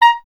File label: clarinet A#, Solo Instruments; Miroslav Vitous - Solo Instruments VOL-1; 90 s Sample CDs